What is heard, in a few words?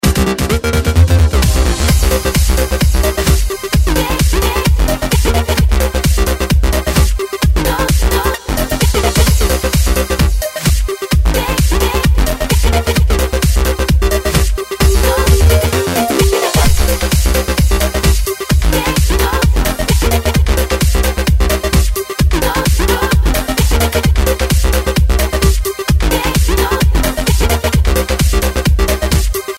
electro remix